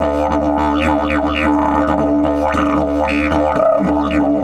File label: 108, DIDGE 108BPM